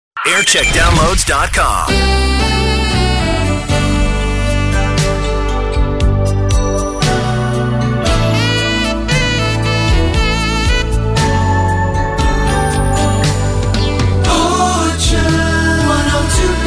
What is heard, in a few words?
Jingle Montage; Orchard FM; JAM